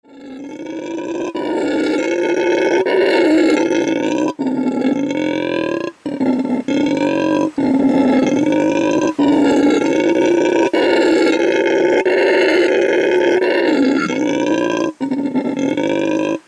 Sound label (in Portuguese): Bugio